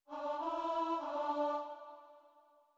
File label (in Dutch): langzame gedeelte zang stem 3